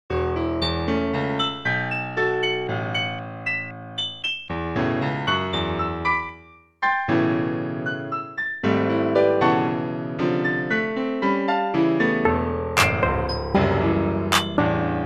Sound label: an irritating piano miniat